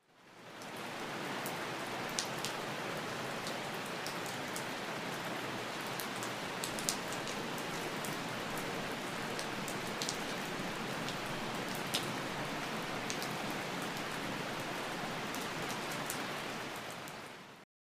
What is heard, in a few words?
light rain